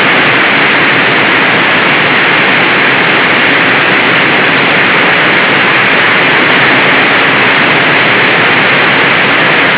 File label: Nature waterfall; Serenesound